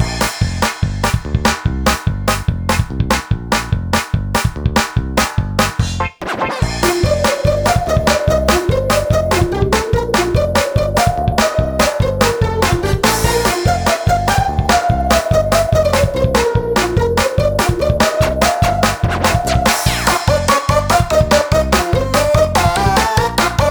BGM; wicked monsters blast hd; wii u

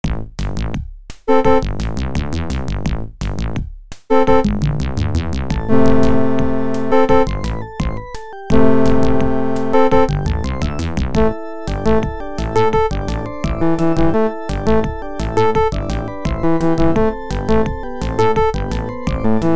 Dark Forest; project toten eisenritter wolf 3d mod

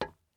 glass step

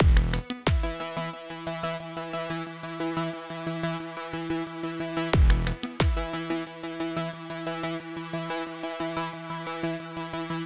Danger Sequence Hit 8 Bars; Vostok Inc.

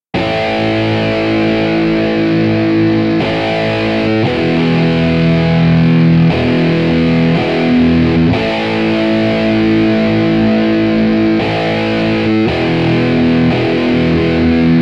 Guitar Dub

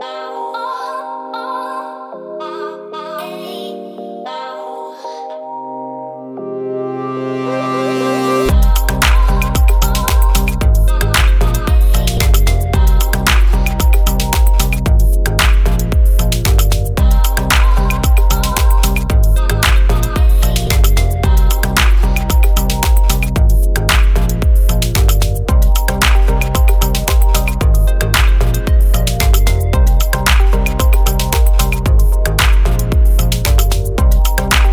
main menu music; Equalizer

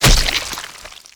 Cake Deco Add; Levels Hub Orleans; Cake Decorate; Cake Deco Add Frosting

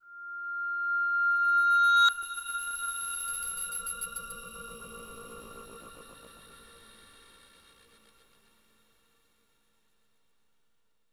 Overlay Tone

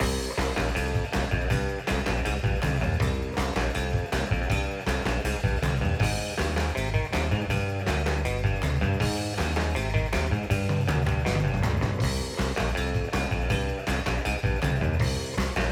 Island Groove

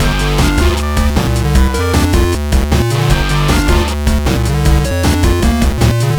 Party Time Music Chip Tune Extended Loop

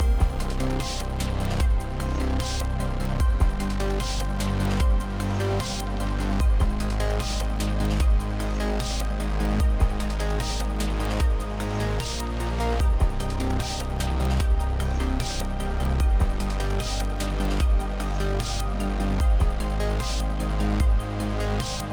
Exploration 8CH 75BPM; Benefactor

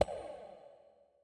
Tempo Drum Hit dead; Mountain SFX

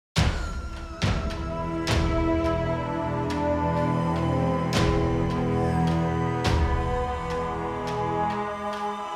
Start battle; Molten Armor

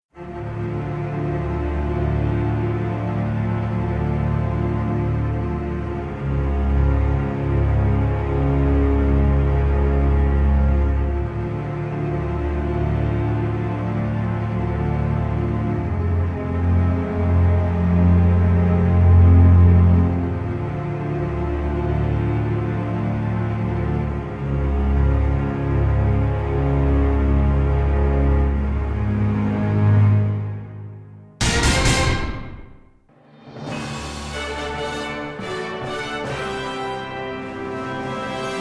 cutscene music

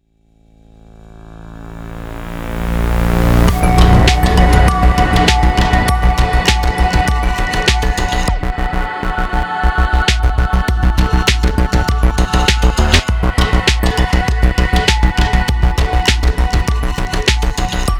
boss verse 8beat intro 100bpm; boss5 verse1

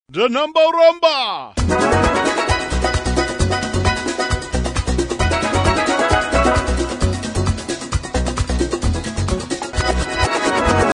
Rumba

